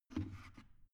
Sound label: plastic water boiler lift up soft; maschinen; wasserkocher